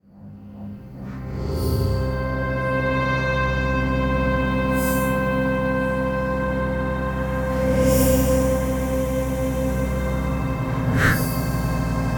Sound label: Electro